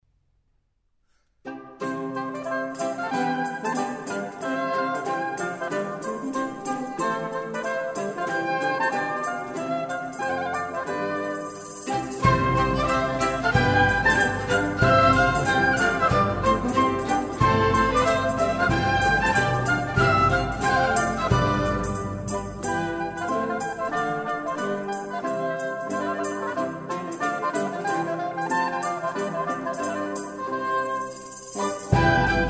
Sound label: Bourrée; Le Divertissement Royal; Du Divertissement De Chambord; Lully; Jordi Savall; Le Concert Des Nations; L'Orchestre Du Roi Soleil